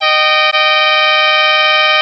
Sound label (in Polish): klakson